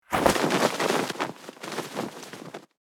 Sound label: Barber cape placement whoosh movement